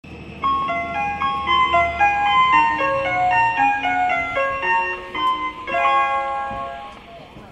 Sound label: chime; shikoku